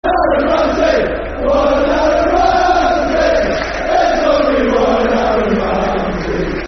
Football Chants (Soccer Songs), Cardiff City; fanchants-com

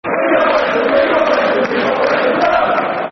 Football Chant (Soccer Song), Man United; We've Got Wesley Brown

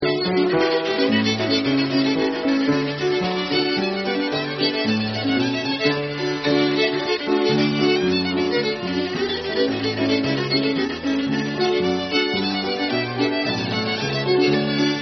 Hornpipe

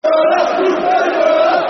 Football Chant (Soccer Song); Dele Adebola; Coventry City Ccfc

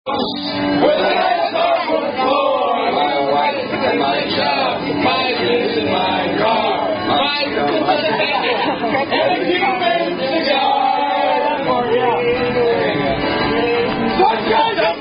Drunk Fraternity Members Singing Along; Delta Tau Delta; Commerical Song

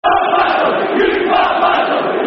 You Fat Bastard, Football Chants; Rangers GRFC

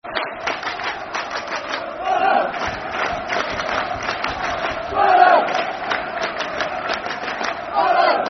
Clap Fulham, Football Chant; Fulham Ffc

